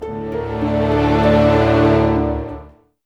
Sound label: ORC DOOM; East West; Scoring Tools; Doom's Day